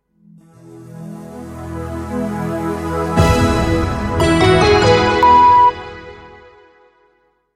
Sound Logo mit Sound Bett 4 Toene; Soundlogos für Ihr Corporate Design

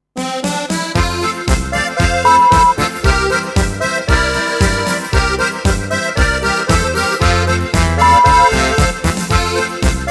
Zillertaler Boarischer E; Volksmusik, alpenländische, bayerische Musik, Zillertal-Sound; Oberkrainer, Zither

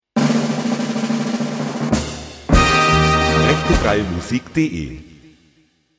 Tusch ta Wirbel

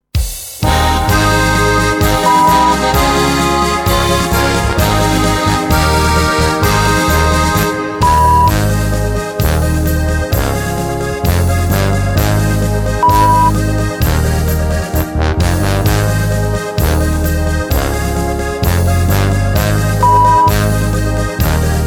Prosit Akkord 130; Volksmusik, alpenländische, bayerische Musik, Zillertal-Sound; Oberkrainer, Zither

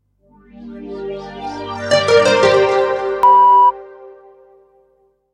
Sound Logo 4 Toene; Soundlogos für Ihr Corporate Design

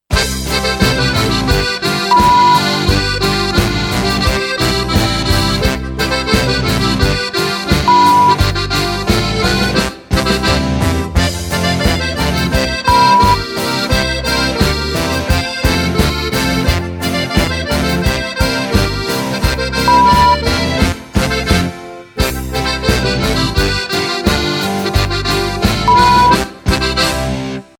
Zillertaler Boarischer A; Volksmusik, alpenländische, bayerische Musik, Zillertal-Sound; Oberkrainer, Zither

